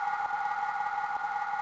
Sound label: rough sand; Quantum Redshift